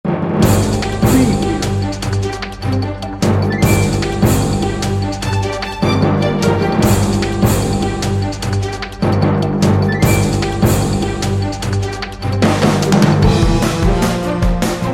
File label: anchor news